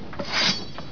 Fight; 特酷声音素材库

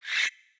menu slide

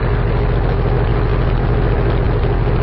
Sportscar Idle; 暴力赛车

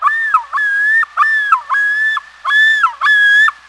Bird; 特酷声音素材库

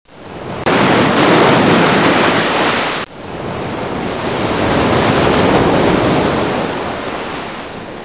Water, 水声; 特酷声音素材库

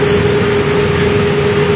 Truck; 暴力赛车

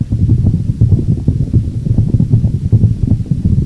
Rocks; 暴力赛车

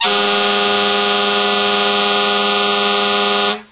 FIRETRUCK HORN; 暴力赛车

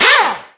Repair; 暴力赛车

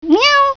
CAT, 猫; 动物; 家畜; 特酷声音素材库

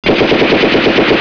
SHOOT, 射击; 特酷声音素材库

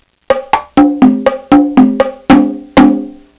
Joy, happymusic; 特酷声音素材库; 背景音乐

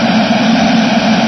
turbo; 暴力赛车

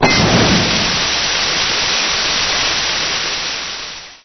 Fire hydrant; 暴力赛车

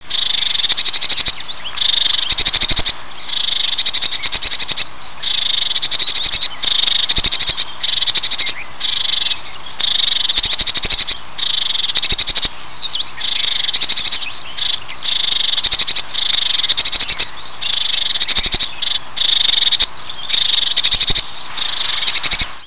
Bird; 特酷声音素材库

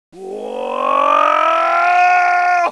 Fight; 特酷声音素材库

